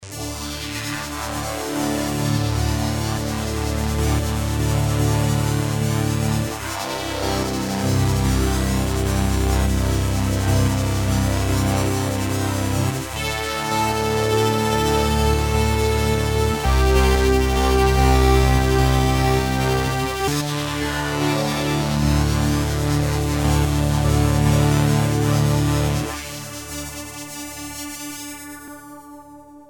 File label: raw pad; Red Sound Darkstar